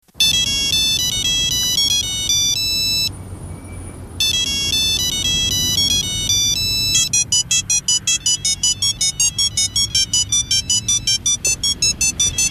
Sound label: motorola evolution ringtone